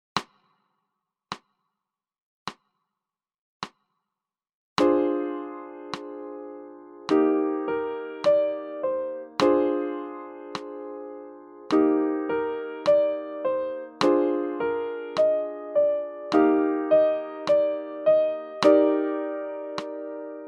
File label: Dorien var clics; theme dorien